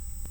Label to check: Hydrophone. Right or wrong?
Right